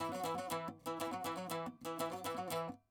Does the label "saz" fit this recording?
Yes